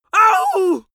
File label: pain compassion male; vocals; comedy-cartoon; soundpool